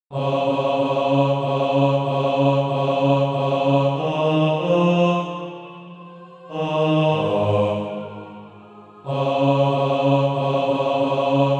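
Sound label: bass, Practise Music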